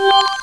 drop item; alienshooter

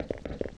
footsteps; alienshooter

